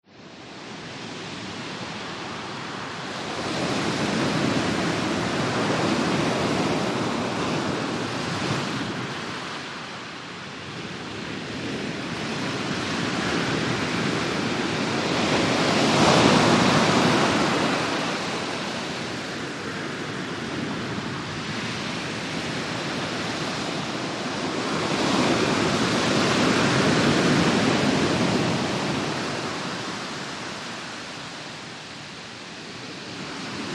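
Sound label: Broad Warm Surf Pebble, waves & surf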